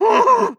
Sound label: warning, zombie vox; creatures